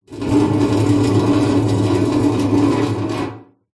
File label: foley metal container bin empty drag scrape on concrete mic inside pov